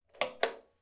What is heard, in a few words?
household light switch pull cord on or off